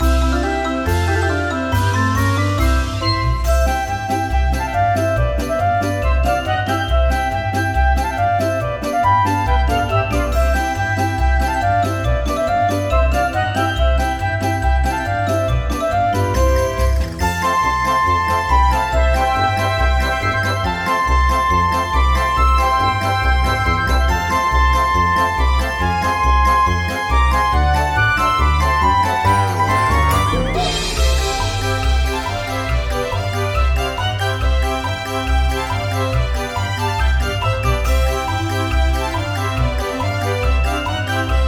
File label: game background; Magical Drop VI; Windows